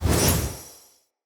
Staff Caster Charge Heavy Start Short, Weapons; Player; Staves; Galene

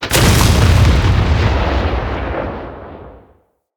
Tesla Shot; Encounters; Ode Pollution Tower

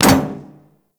Ship Hit; RailJack Hit Effects

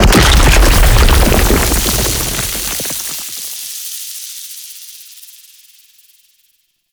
Octopede Death Dissolve; Warframe; ManInTheWall

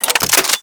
Rifle Reload; Warframe; Bolto

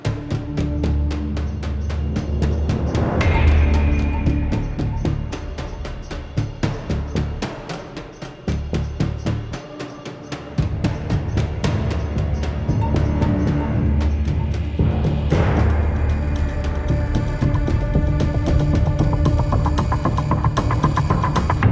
Ship Fly In Cue, Music; Warframe; Cinematics; New War; Enemy Level Spawn

